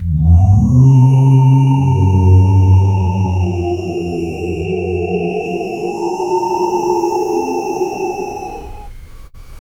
very deep rumbling sinist, male

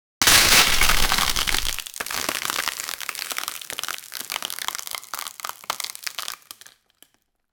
destruction

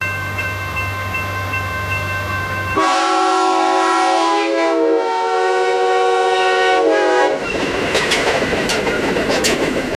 train horn approaching

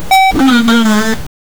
sound of fail

